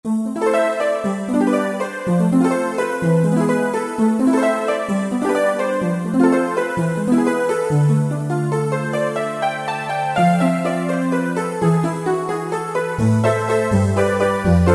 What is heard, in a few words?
Space Harp, Roland JD-990; Factory Patches